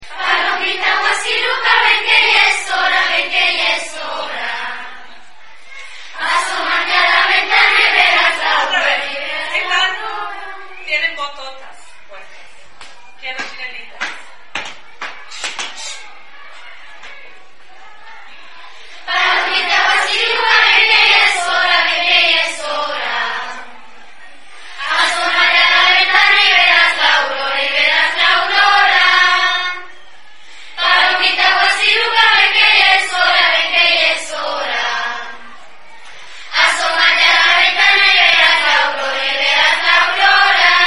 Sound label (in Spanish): coro juvenil